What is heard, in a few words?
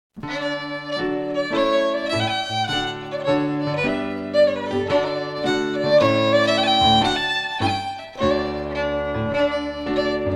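Strathspey, Scottish Fiddling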